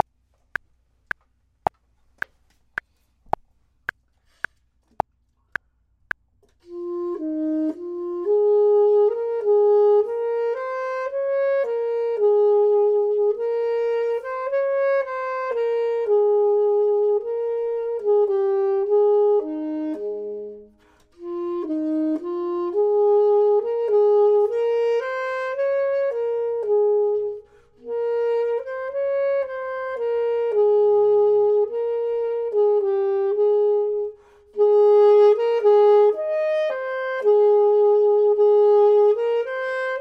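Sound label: top, Saxophone and Clarinet; voxman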